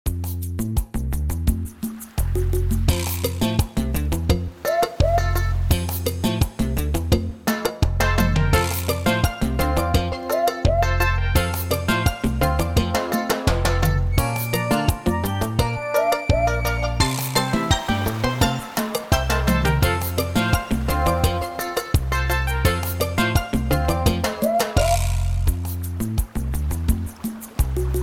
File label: Bg Music; Fiji